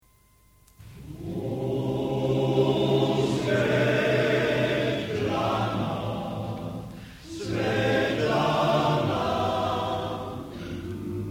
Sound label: male choir, live; male choir of mephi; swetlana